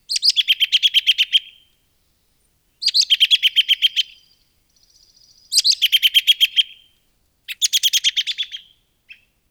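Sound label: American Robin whinny calls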